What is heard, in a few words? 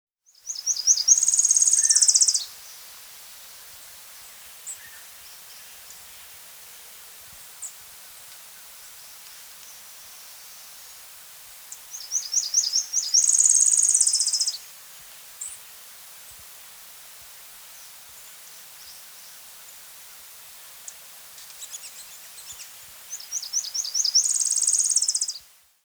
Tropical Parula (Parula Pitiayumi); S. America